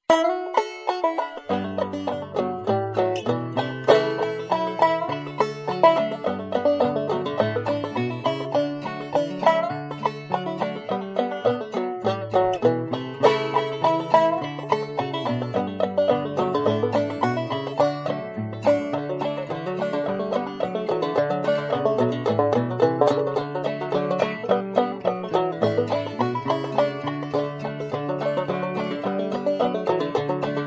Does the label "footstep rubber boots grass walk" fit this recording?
No